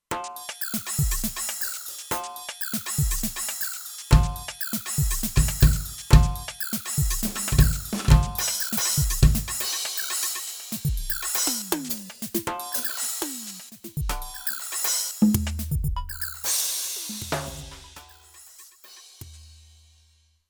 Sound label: Drum Arps, Kurzweil K2500X